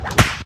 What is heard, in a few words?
Swish Crack